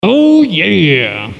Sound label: Excitement, Vocals, bowl